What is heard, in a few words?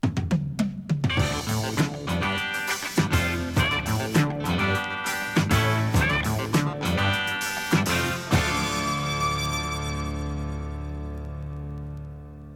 topformat maxi jingle single